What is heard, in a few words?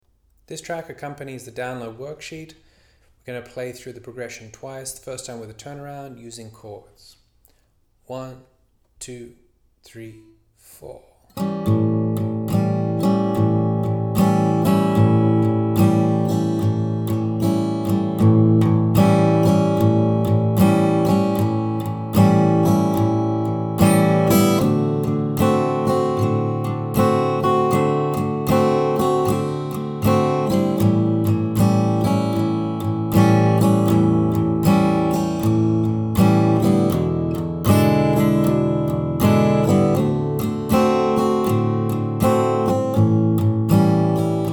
Backing Track Chords